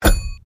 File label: clock; worldmap square